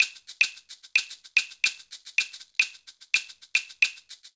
Guaguanco 1 Maracas Claves 220bpm; Latin Percussion; Ableton; Factory Packs

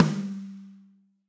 Snare Rim Warm And Low; Drum Hits; Standard Hits; Ableton; Factory Packs; Session Drums Club

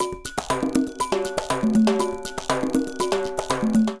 Plena, Latin Percussion, Drums; Ableton

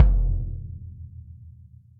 KICK open felt

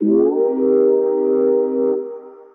Bender Chord; Synth Pad; Ableton; Chop and Swing

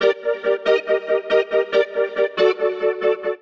Pad (Gm) 140bpm; Music Loops; Thunder Coast Pad; Ableton; Factory Packs; Singularities

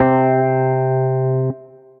Suitcase Funk, Suitcase Piano; Suitcase Deep Roots Funk; Ableton; Factory Packs; Electric Keyboards

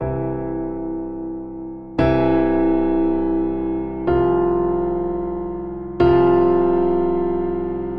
Vamp Waiting C Major C Sus4 120 bpm, Grand Piano; MIDI Clips; Ableton; Factory Packs

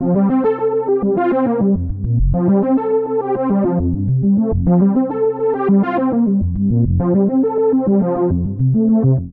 F Major 103 bpm; Accompaniment; Tonal; MIDI Clips; Flight Time; Drive and Glow; Ableton; Factory Packs

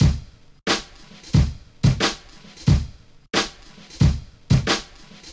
Kit Hip Hop; Drums; Ableton; Breakbeats by KutMasta Kurt

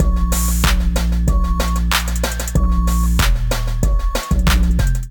Digi Kit groove 94 bpm; MIDI Clips; Ableton; Factory Packs; Beat Tools

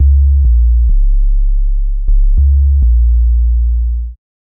Boom Nation groove 101 bpm; MIDI Clips; Ableton; Factory Packs; Beat Tools

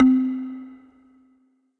Bamboo Mallet, fleamarket percussion; Instruments; Ableton; Factory Packs; Lost and Found

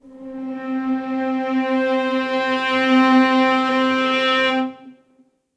Glacial (Pressure) - String Quartet; Instruments; MPE; Ableton; Factory Packs; String Quartet by Spitfire Audio

